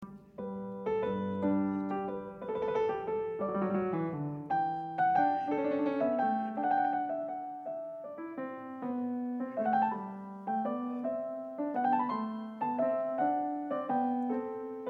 Bach Goldberg Variations a 1 ovvero 2 Clav, PIANISTS